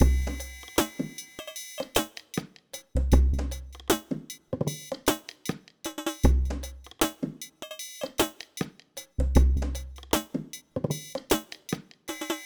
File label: dry (s)